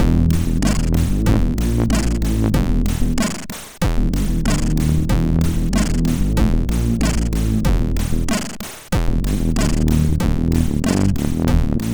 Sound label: Heavy Riff, MOS6581R4; hvsc